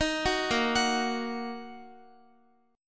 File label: Bb Suspended 4th Sharp 5th Bb down Guitar; arpeggiated; tuning 4E,4A,5D,5G,5C,6F